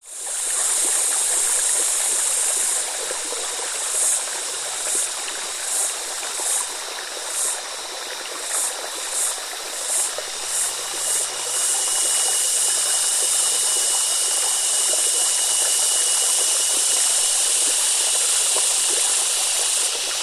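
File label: nature forest ambience summer brook creek close insects birds australia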